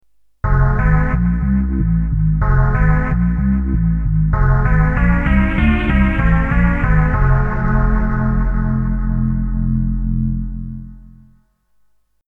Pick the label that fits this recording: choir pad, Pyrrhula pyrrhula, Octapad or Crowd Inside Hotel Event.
Octapad